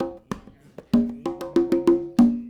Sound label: Congas, 70s Analog Funk; Sonic Foundry (Sony Creative Software); What it Is!